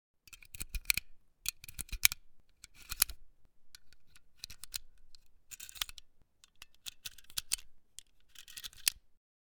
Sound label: gun 1911; weapon; still north media